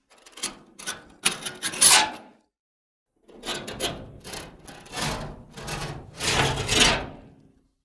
Just Metal Scrapes and Scratches; soundbits

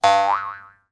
cartoon spring bounce twang

